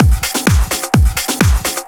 Discohouse Loop 128 BPM

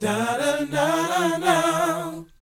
BOYZ B BD, Vocal Planet R&B Dance; Spectrasonics Vocal Planet

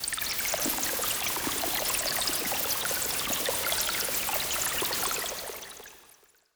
Water; M8; Electronisounds; Synth Prophet One Shots

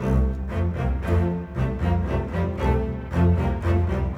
Bass Cello, Orchestral; Sonic Foundry (Sony Creative Software); Orchestral VOL-2; Modern 31

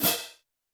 percussion, Roland TD-20

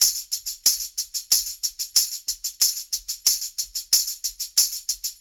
Call And Response Tambourine, 70s Analog Funk; Sonic Foundry (Sony Creative Software); What it Is!

